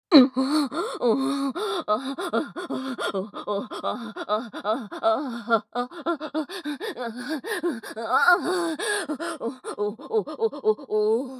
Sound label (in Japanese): 喘ぎ声・激しい